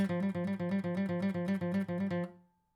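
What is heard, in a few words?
acoustic pattern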